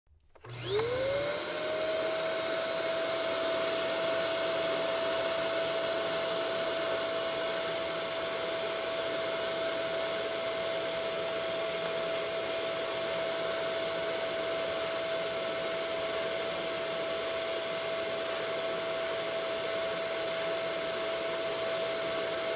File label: vacuum cleaner